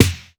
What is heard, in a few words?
Korg 01W MegaDrums; 300 Drum Machines